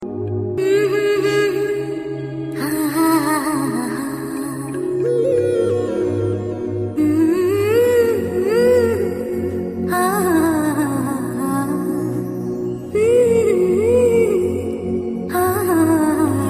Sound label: Bgm Nice Voice